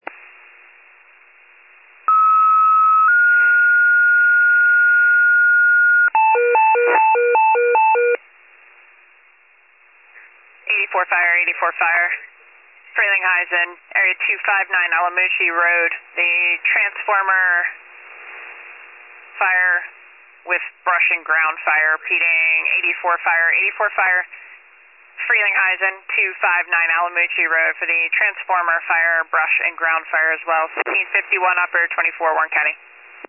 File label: FIRE pager alert